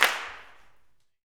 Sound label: CLAPS, Gospel; Spectrasonics; Vocal Planet Vol.1 Gospel; SNAP